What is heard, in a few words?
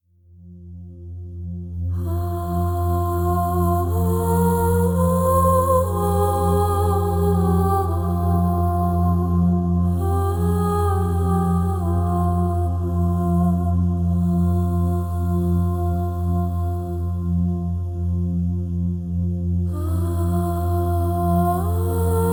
relaxing music